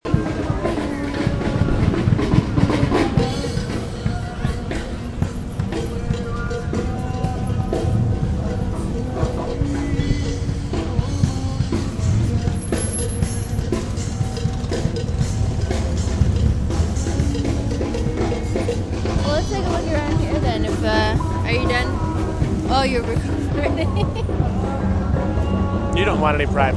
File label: yonge dundas square